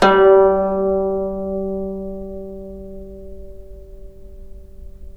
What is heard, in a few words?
GUCHIN G2; INIS - Opium